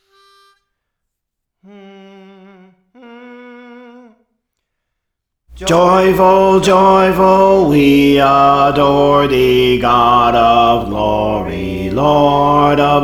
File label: Bass; Praise For The Lord Training; Joyful Joyful We Adore Thee